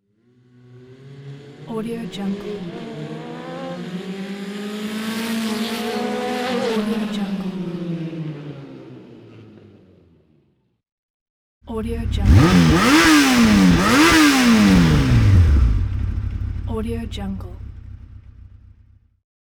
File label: motorcycle